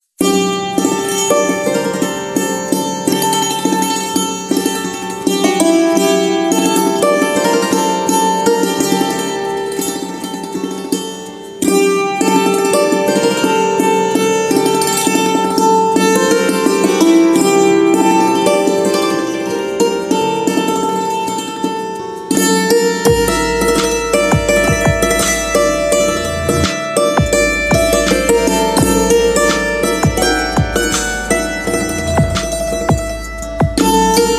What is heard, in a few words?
santoor Instrumental Ringtone